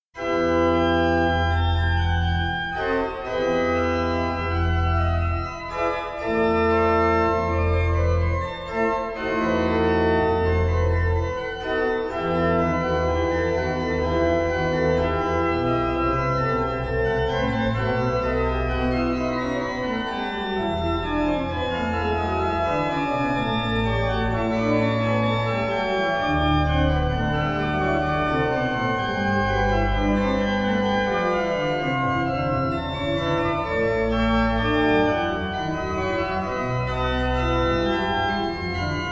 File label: Prelude and Fugue in E minor, Prelude; JS Bach; Wedge BWV 548